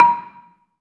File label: BELA2 A#4, BELAFON, Asian Traditions; USB Soundscan vol.60; AKAI